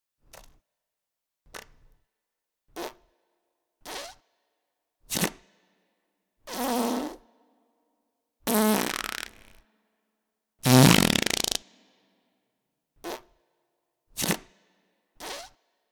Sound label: Fart Tone